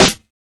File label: airy snare drum a sharp key; modern snare drum